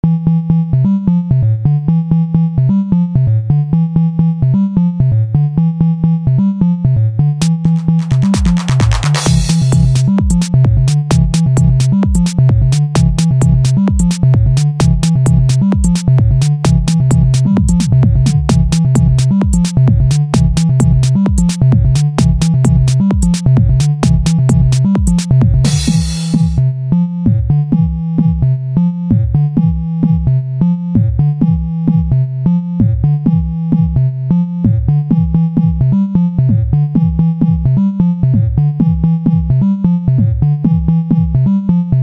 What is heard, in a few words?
drum